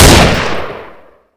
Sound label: tavor; garrysmod